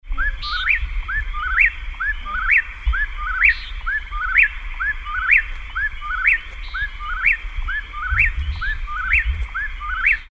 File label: Whip-poor-will